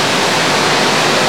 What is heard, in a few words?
Static Loop; Miscellaneous; 1001 Sound Effects